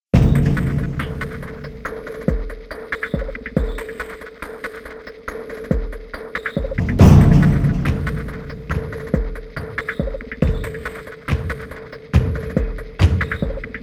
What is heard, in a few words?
Percussions MIX; fxpansion; Nadis